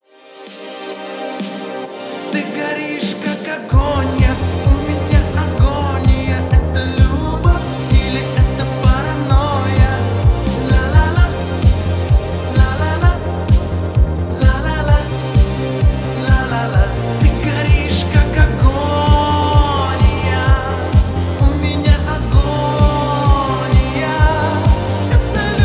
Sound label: retro cover